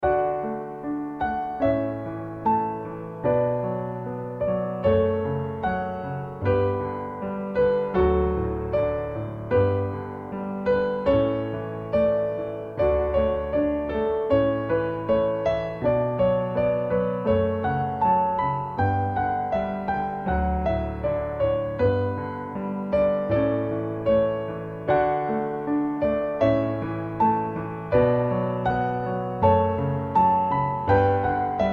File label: canon piano